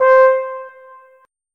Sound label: tuba note